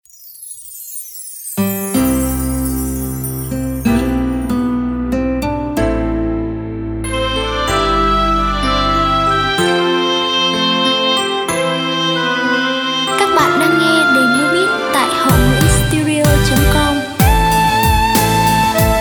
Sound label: beat, playback